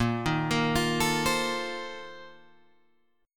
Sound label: Bb Major 7th Suspended 2nd Suspended 4th, Guitar, Open G